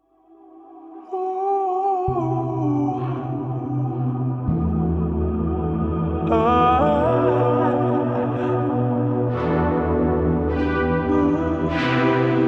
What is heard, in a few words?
Modern Pop Example